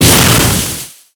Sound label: Object Snowball; Bombergirl Rainbow; Arcade